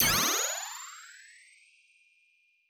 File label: laser beam; fx